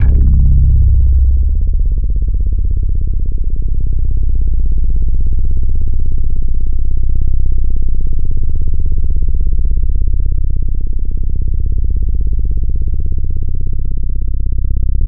Synth Bass; Sonic Foundry; Bass X

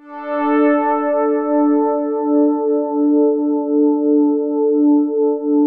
5TH SOFT D3; SPACIOUS; Music Store - Claudius Diemeter Schwerlos; SPACIOUS 1

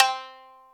Shamisen C3; Multisamples; Digital Sound Factory Planet Earth

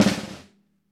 Ludwig Ruff, drums; PX7; Digital Sound Factory Protean Drums

